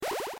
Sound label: Powerup